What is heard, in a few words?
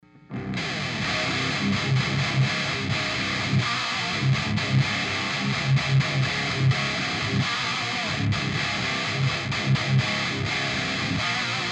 carvin tone